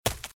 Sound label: Footsteps Leaves Sprint Right; RadwinIsland